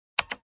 Keyboard Key press slowly

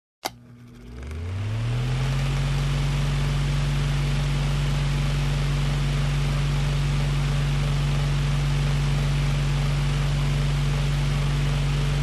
Fan

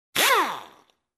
Electric Driller Revving sound

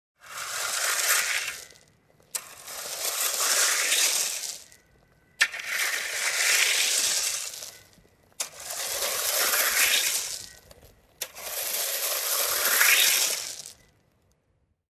Flatten out gravel with shovel

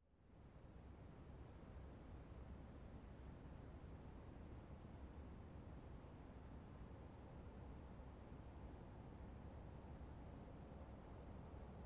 Truck approaching and parking

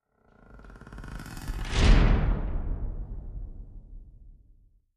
Warehouse Creaky Doors closing

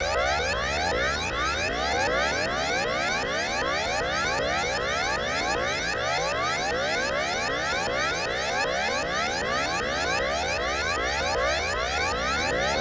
Classic Headquarters Sci-Fi Alarm

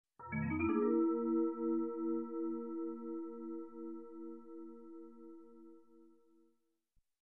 Suspicious mallet sound effect

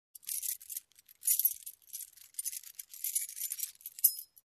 Keychain tinkling

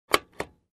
Aircraft Seat Table Closed

